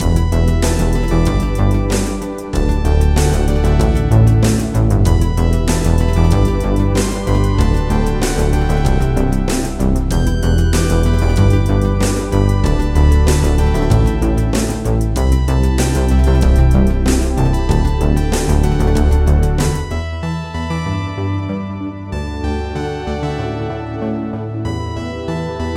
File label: MUS lobby; royal lobby